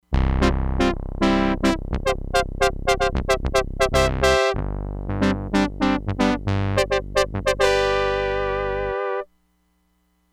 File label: SOLO BRASS, Crumar Bit 99; Factory Programs